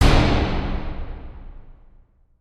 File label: piston arrive